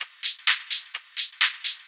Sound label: Hihat Loop 128 BPM